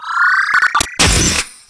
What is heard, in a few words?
emp act; zaero; items; empnuke